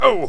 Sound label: Pain